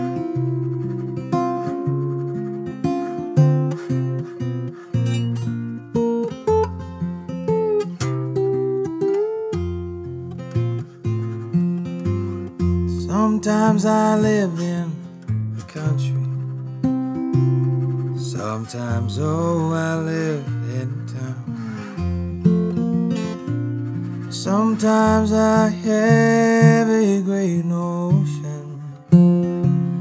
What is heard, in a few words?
blues